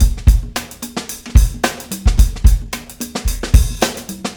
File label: vintage funk kit; Garage Band